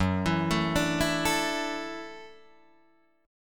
Gb Minor 7th Sharp 5th, Guitar DADGAD

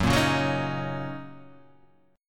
Fsharp 6th down Guitar Half Step Down

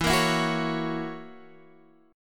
F sharp 7th, guitar

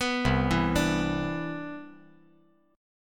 C Major 9th, C, down, chord

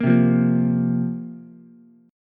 C 7th Suspended 4th, Ukulele; strummed; C7sus4

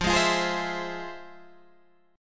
F Minor Major 7th, F, Guitar, Open G; 15,13,15,13,13,14

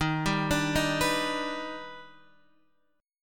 D sharp Augmented Major 7th D sharp, Guitar; D#+M7 Chord {X 6 5 7 4 7} arpeggiated

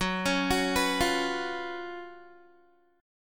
Gb Major 7th Suspended 4th Gb Guitar

